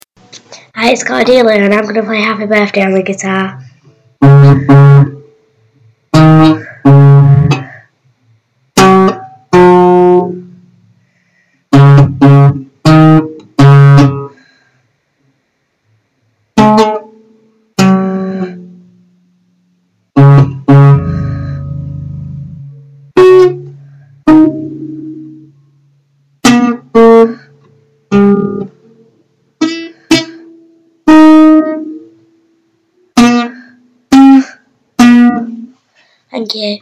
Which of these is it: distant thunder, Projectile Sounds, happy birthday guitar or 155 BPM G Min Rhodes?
happy birthday guitar